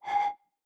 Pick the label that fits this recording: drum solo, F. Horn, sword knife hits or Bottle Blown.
Bottle Blown